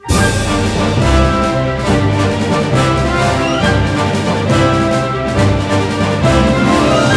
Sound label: Normal Battle Intro; Gran Saga